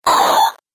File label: Robot Droid Death, Robot Sound Effects